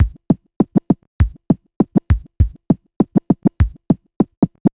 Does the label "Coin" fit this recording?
No